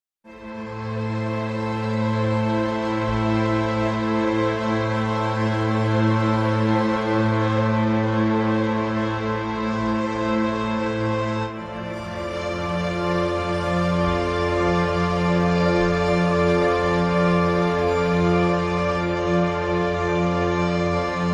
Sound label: Lesser Strings Chords Only